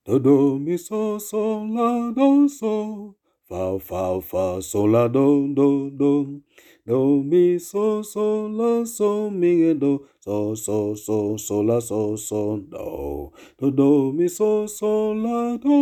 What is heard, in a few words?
Bass